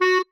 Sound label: marimba penguin; ゆめ2っき